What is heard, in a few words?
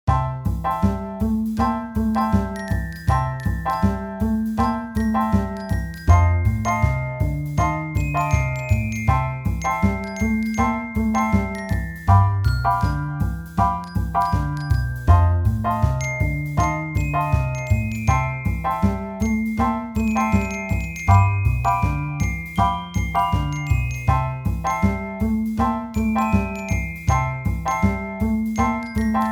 MIDI blues; spellman studio